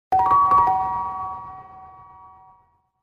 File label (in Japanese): ピアノ通知音